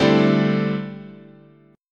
D 9th Suspended 4th, guitar chord

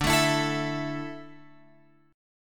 D Suspended 2nd D down Guitar Open F, chord; strummed

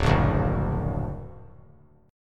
D Augmented D down; D+ strummed; JGuitar

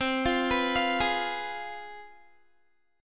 C Major 7th Suspended 4th Sharp 5th, down, Guitar; CM7sus4#5 arpeggiated

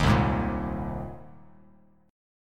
C Minor 7th Sharp 5th C Bass Standard; Cm7#5 strummed; JGuitar

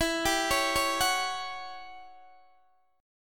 E Minor 6th Add 9th, Guitar, DADGAD; chord shape

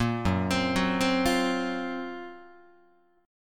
F sharp Major 7th Flat 5th, down, Guitar; 1,2,3,4,4,4; 4A,4E,5A,5D,5Gsharp,6Csharp; arpeggiated

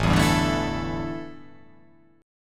A 7th Suspended 4th, A, down

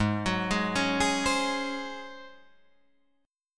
Ab 11th Ab Guitar Drop D; arpeggiated; JGuitar

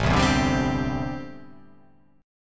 A Minor 11th A, Chord

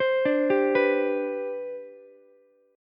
C Major 7th Suspended 2nd C down Ukulele, chord; CM7sus2 arpeggiated; JGuitar

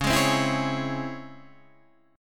C sharp Minor Major 7th C sharp, chord; C#mM7 strummed; JGuitar

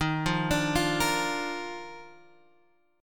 Eb Major 7th Suspended 2nd, Guitar Open G; 13,10,12,10,11,x; down

